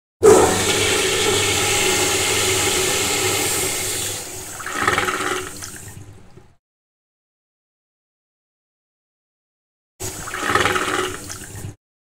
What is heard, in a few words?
Toilet Flush